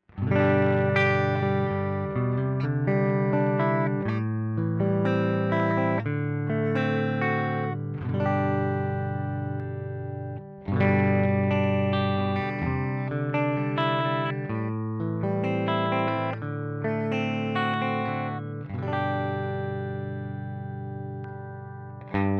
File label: Gibson R4 P-90 Comparison